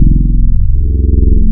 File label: OBERHEIM OB8, wavetables